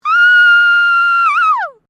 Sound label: FEMALE SCREAM WOO; Female vocal sounds; Screaming (Sound Effects:Voice)